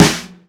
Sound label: soft hitclap